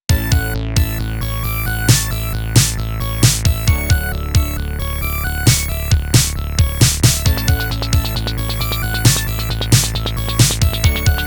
off vocal

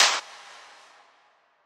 Clap

